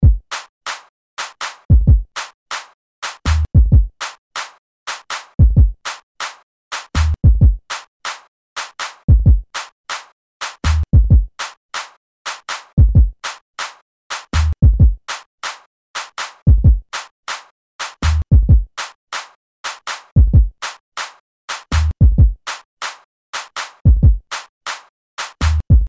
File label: BEAT RASTEIRO